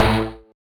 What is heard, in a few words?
marimba steeldrum